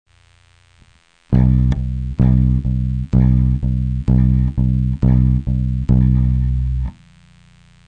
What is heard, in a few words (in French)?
accent, bass; TRAVAIL DES ACCENTS